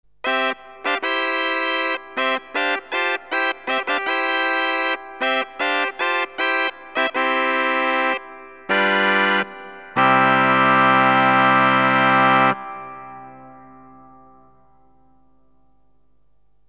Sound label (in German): chamade 8, sakralorgel